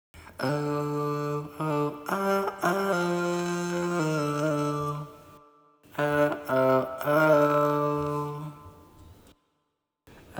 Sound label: vocals